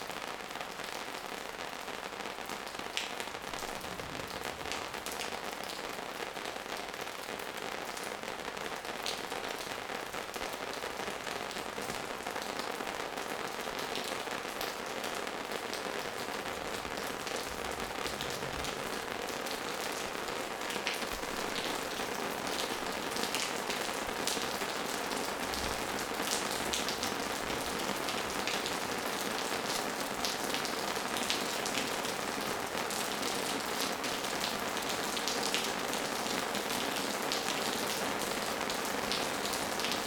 Regen (Rode NT4); Zoom H6 (24 Bit, 96 kHz) mit Rode NT4 Stereo-Ko; Curacao; Fieldrecordings

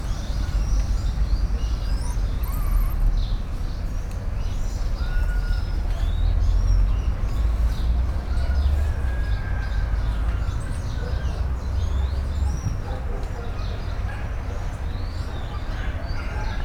Im Garten; Brasilien; Fieldrecordings

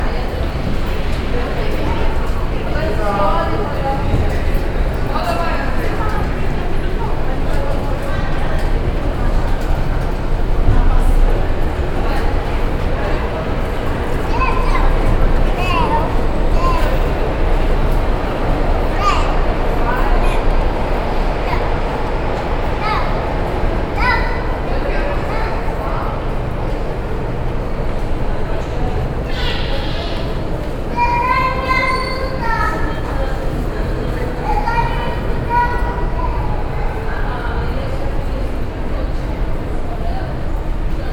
auf der strasse, Brasilien; Fieldrecordings

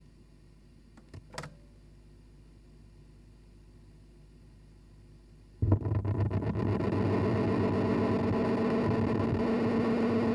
Uher 19 cm Lautsprecher Tonband; Abspielen der Aufnahme wendelrekords 4 Tape Echo Mix

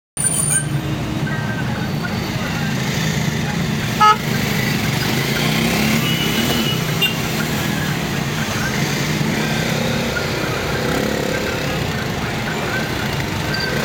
Im Verkehr, Kathmandu, Nepal; Boudha, Kathmandu, Nepal; Putali Sadak, Bhaktapur Sadak, Khulla Murch Park

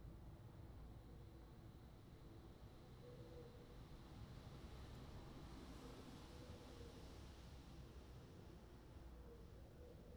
Regen auf Fensterbaenken; 6 Kanal; Fieldrecordings

